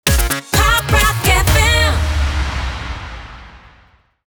Pop Rock Jingle Sting

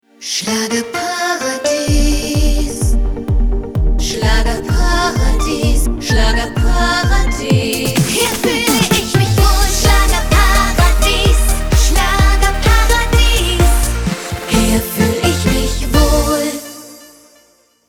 Schlager Jingle Slogan

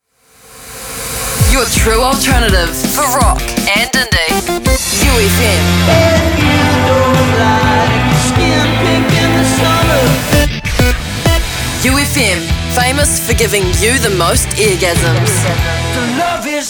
ROCK INDIE Sonic Imaging Montage; UFM

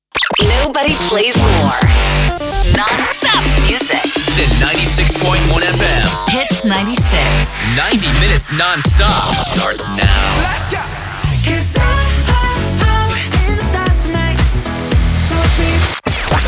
SONIC IMAGING MONTAGE CHR DANCE; Hits 96

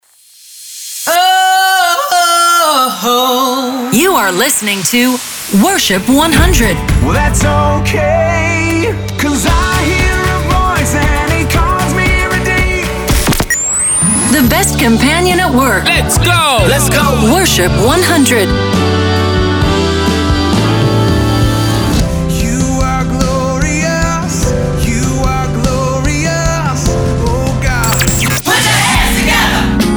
Imaging Montage Country Gospel; Worship 100

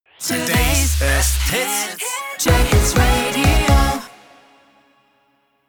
Sung Jingles Radio, 125bpm C minor, Sting; JayHits Radio

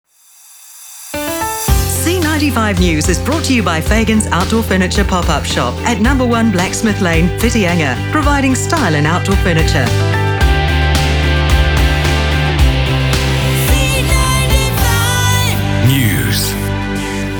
Jingle News Intro Bed; C95 Jingle Package

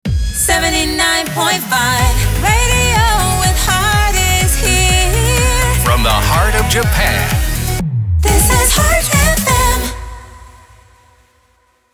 Sung Jingles Cmaj 124bpm SLOGAN; 79.5 Heart FM

